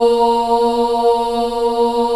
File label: A#2 CHI OH, CHILD OHF, Choir; USB Soundscan vol.28; Acoustic & Synth; AKAI